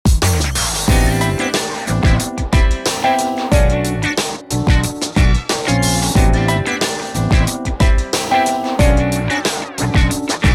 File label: Music Menu event